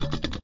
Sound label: Shake Metal Loop; talking tom cat 2 android ios online gamerip 2011